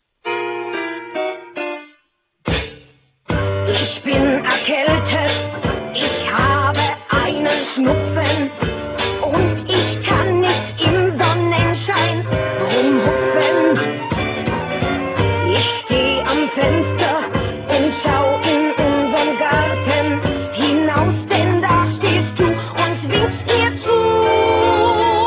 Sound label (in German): hatschi